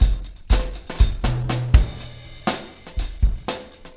Room

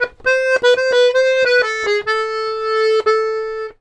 accordions; USB Soundscan vol.40; AKAI

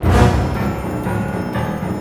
GRO, Orchestral Loops; 128 PREDATOR

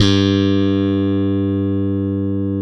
POP BASS, Electric Basses

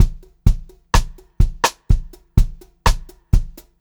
126 Pop-Rock Drumloops; 126 POP 4

